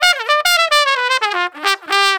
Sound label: 110 NuJazz Trumpet _Dmaj; Brass; Pro Tools Essential Loops; Digidesign